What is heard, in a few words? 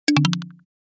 Stock Notification; Bezel; HTC 10 - Sense 8 Sound Suite